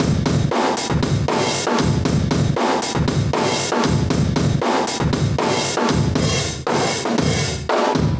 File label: 117 Beat, breaks